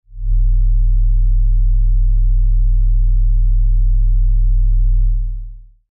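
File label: Production Element Low Frequency Effects Pulse Tone; Lfe; Deep Tones; Sound Design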